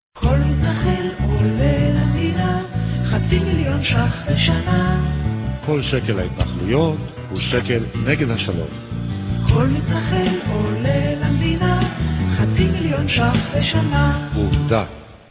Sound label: jingle